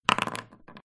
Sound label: dice roll; Dice World